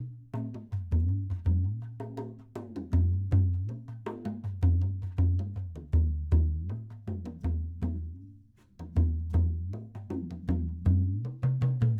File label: talking drum